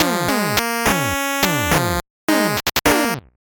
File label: 8bit jingle slow